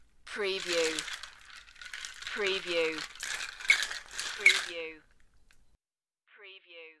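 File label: Ice Shaker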